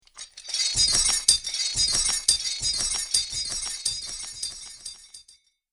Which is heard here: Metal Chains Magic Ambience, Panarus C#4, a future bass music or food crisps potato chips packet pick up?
Metal Chains Magic Ambience